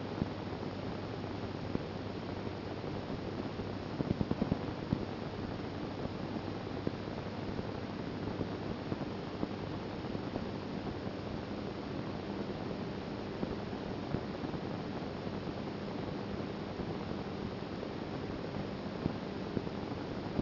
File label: PSR B0329 noise